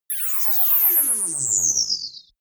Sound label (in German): power drop, energie